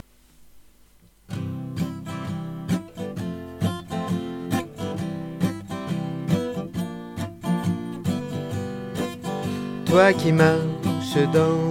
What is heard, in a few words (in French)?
rose chant